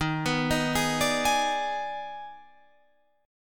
Eb Major 7th Suspended 4th Sharp 5th, Guitar Drop D; 13,14,13,13,15,16; down